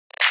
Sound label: Clap